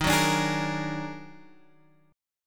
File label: Eb Minor Major 11th, Guitar, Open G